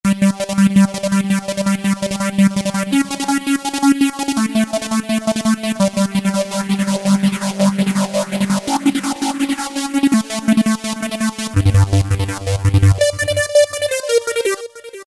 Mad Lead, Korg MS2000; Factory Patches

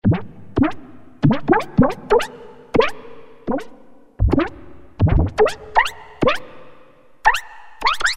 WET, Roland MKS-50; Factory examples

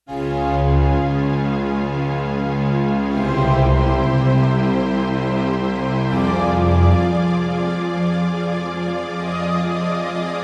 Slo pia string; Digital Synthesizer

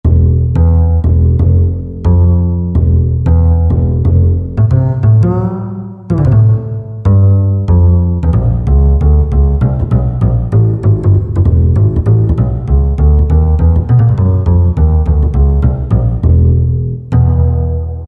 Ac. Bass, Roland SR-JV80-01 Pop

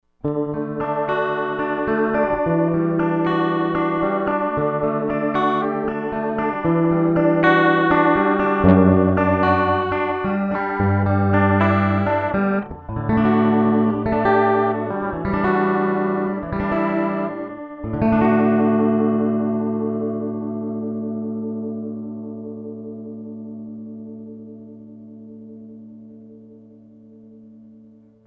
Vibrato at max Delay at min

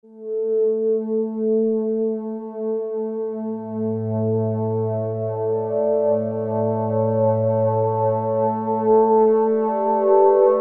Vox, Cheetah MS6

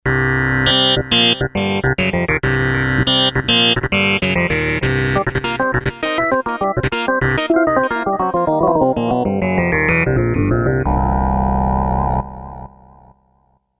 Kawai K5000S; PwerPulp

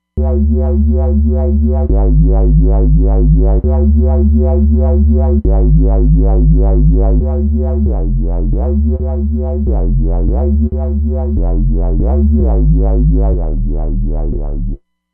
D n' Bass

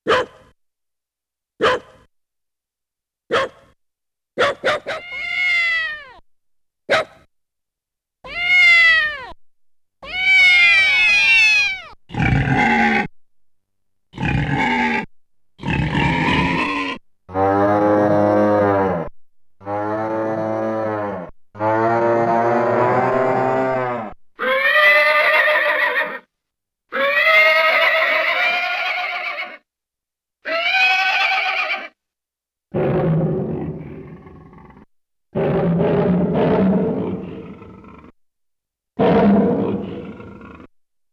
Animals & Human; Yamaha TX16W

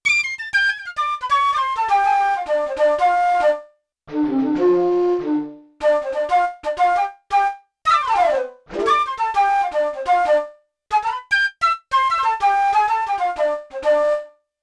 Flute, Yamaha TX16W; Combo Performance 1

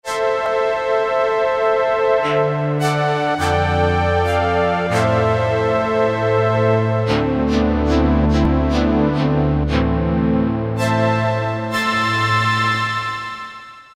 NOISTR, Ensoniq ESQ-1

